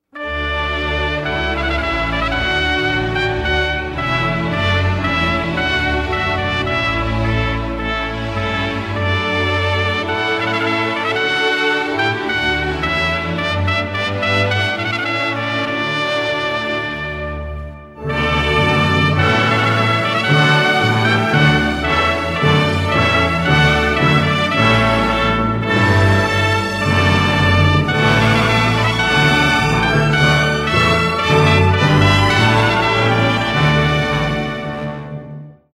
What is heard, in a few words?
trumpet voluntary